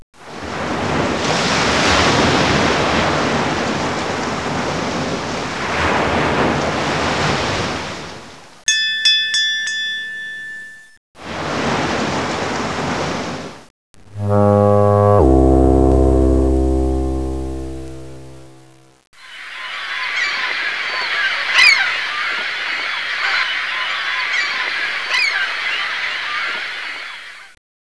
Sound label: sea sounds